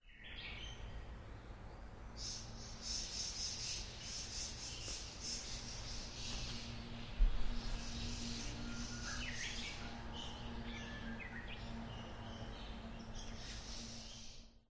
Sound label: twitter, animal